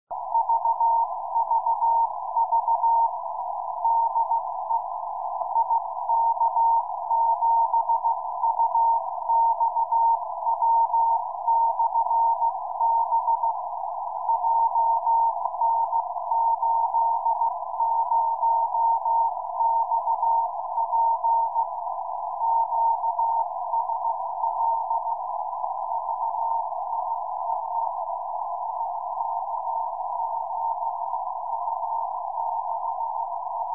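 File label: beacon; IK7UXW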